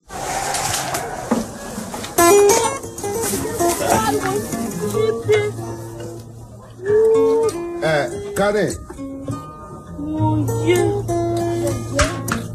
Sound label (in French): Chanson africaine